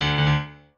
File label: piano